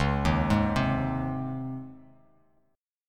C sharp Major 7th Suspended 2nd C sharp, Bass Standard; C#M7sus2 arpeggiated; 9,6,6,5; JGuitar